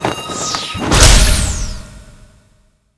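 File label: harpy thunder attack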